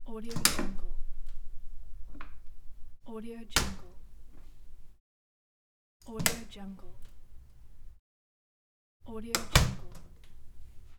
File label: medicine cabinet sounds pack